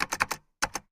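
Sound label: computer keystrokes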